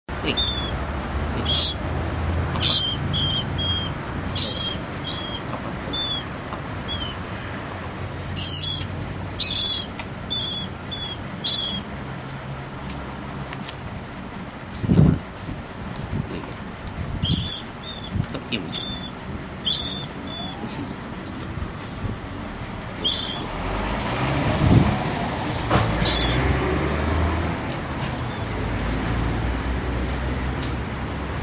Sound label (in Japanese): hatsuka nakigoe